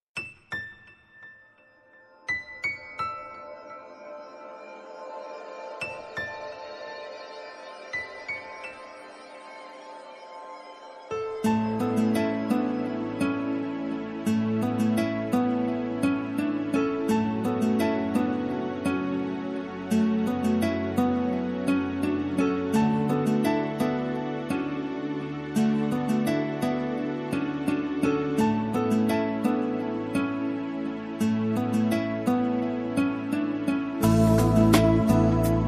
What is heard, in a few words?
sounds of guitar